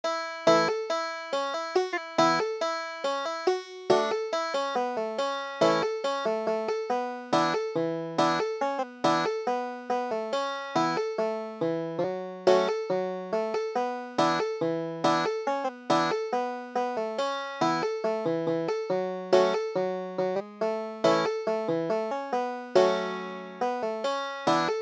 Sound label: salt spring, banjo